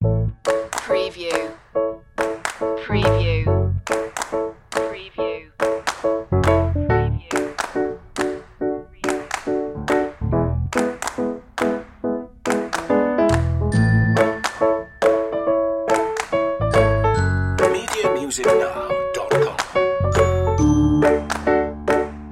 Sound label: upbeat piano commercial